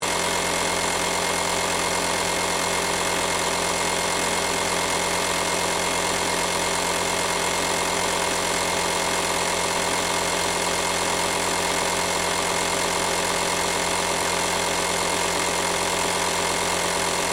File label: Air Compressor Small Operating Loop, Small domestic machine; Spraying (Sound Effects:Objects)